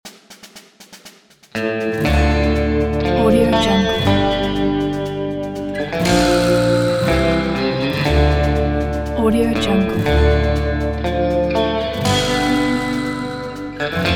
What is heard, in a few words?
western cowboys logo